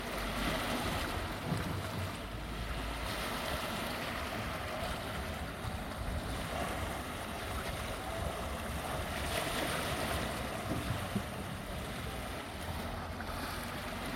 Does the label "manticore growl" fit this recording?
No